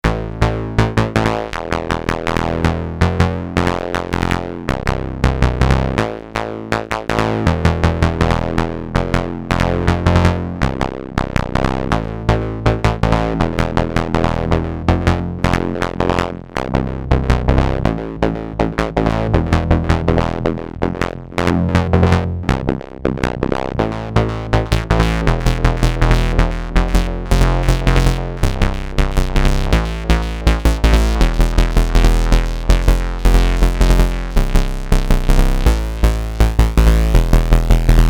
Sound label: Subsequent 37 Stomper